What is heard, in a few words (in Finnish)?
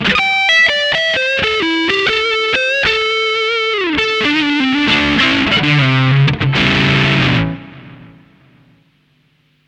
solo strato kaula; v-twin